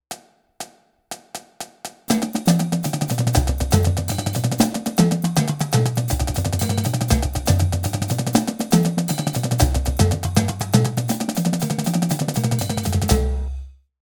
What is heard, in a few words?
Chuggeda Threes 120, drumline